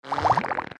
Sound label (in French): Grenouilles